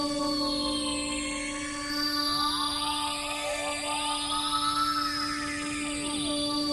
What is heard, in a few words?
amb abstract high; Half Life Model Pack